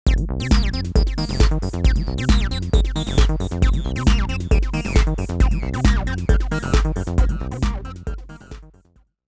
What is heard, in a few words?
looped wave; miniBAE source code audio